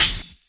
hat one shot d key; drum single hits one shots; hat samples; Duration 0:01; Samplerate 44100 Hz; Bitdepth 24

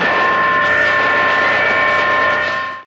big ground alarm

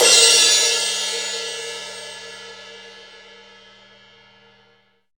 huge crash one shot g sharp key, crash cymbal

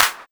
clap f sharp key; snare drum alternatives

